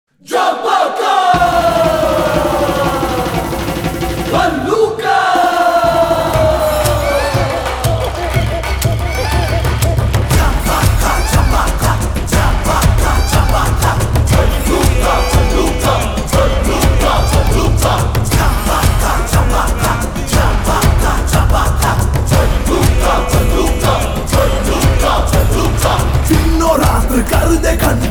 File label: Chandudu Clan Entry Song - Hindi